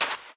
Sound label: sand run, step; blocks; Minecraft; Remastered